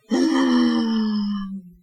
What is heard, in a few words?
Groan